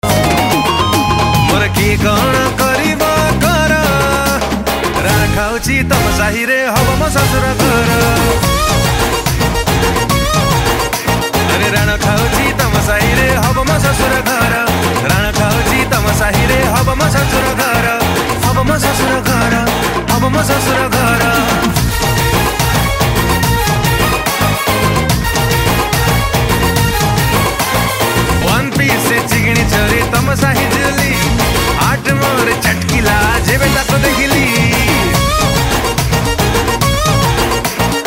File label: human ringtone